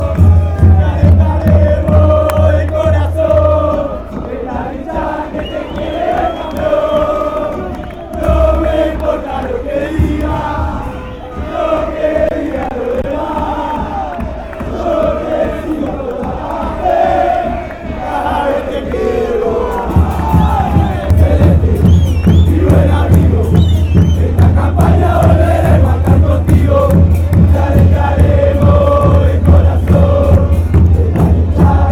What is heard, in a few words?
skyblues my good friend different, chants; raf